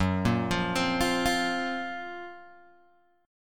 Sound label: F sharp Major Sharp 11th F sharp Guitar Open G